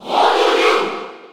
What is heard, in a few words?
Cheer French; Jigglypuff; SSB4